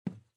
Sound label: run wood